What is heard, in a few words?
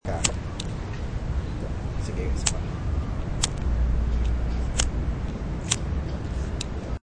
lighter